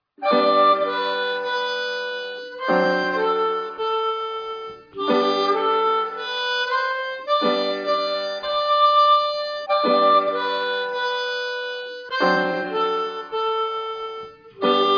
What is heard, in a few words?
Lightly Row, harmonica